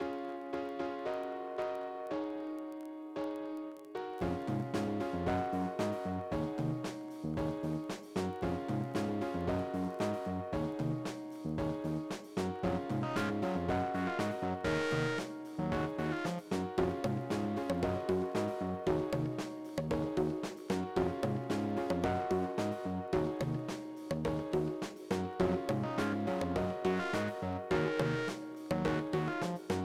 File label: trailer music